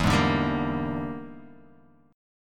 E 7th Sharp 9th Flat 5th, chord

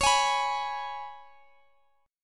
Ab Minor Double Flat 5th

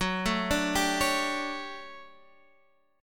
D Major 11th F sharp, chord

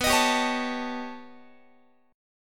B Augmented 9th B Guitar, chord; strummed; JGuitar

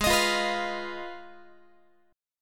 G sharp Minor 6th G sharp down Guitar; G#m6 strummed; x,16,18,15,15,16

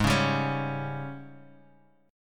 G sharp Major 7th Suspended 4th; strummed; 16,16,17,18

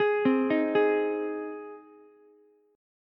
Ab Major Ab down Ukulele; arpeggiated; JGuitar

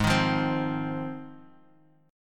G sharp 6th

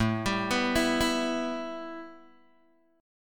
A 7th Suspended 2nd, A, down, Guitar, Open G

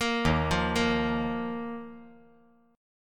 D sharp Suspended 2nd D sharp; arpeggiated; chord; 3,3,1,1; JGuitar

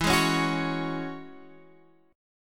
F sharp 7th Suspended 2nd E

